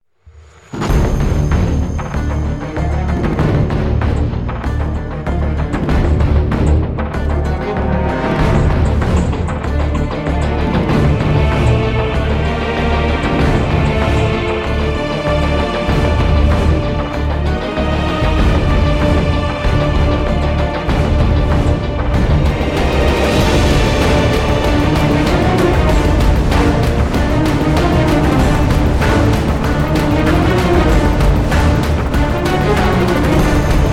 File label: jeep ride; boneyard